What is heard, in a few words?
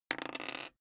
die roll table long